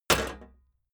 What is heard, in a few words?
sheet clank damped